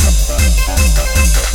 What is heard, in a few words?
drumloop; awe32